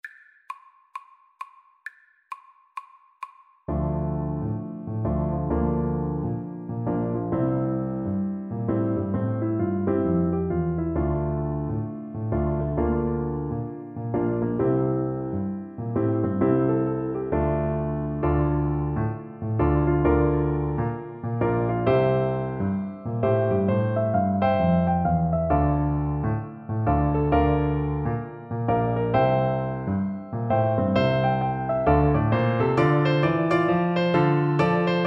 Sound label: tam lin BN, Bassoon